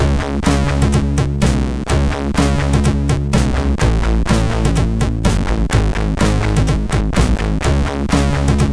Cool Bass, MOS6581; sid

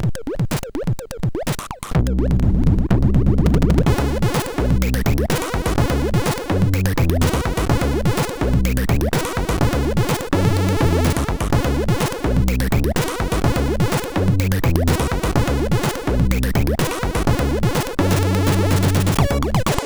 Ode in D, MOS6581R4

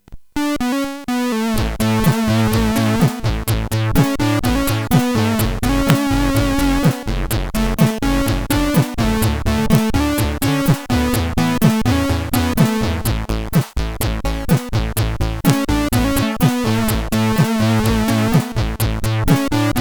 Breath, CSG8580R5

